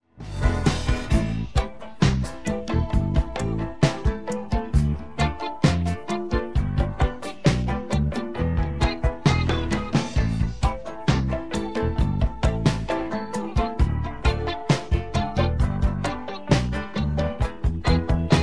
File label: instrumental; demixificateur